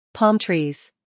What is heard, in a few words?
palm trees